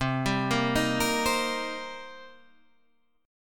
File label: Eb 6th C, guitar